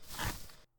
Sand walking Step

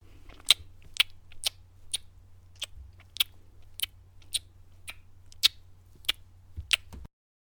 Puddle

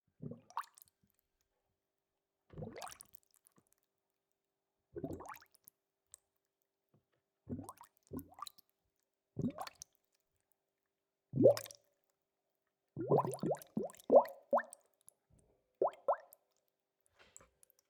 Hollow Water Big Bubbles Bubble Sounds

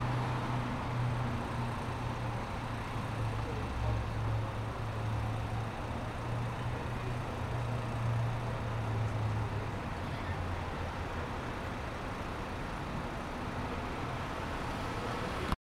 Street Berlin Field Recording Atmo Short

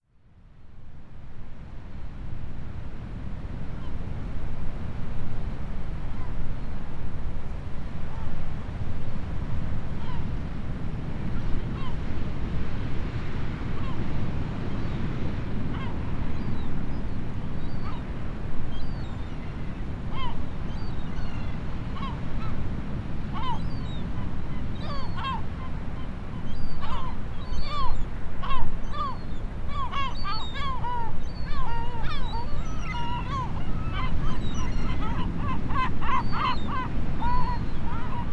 Sea And Seabirds

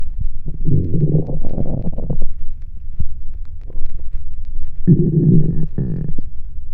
Stomach growling

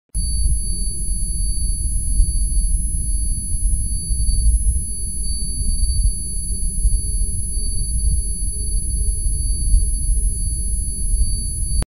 Concussion Stun Sound Effect

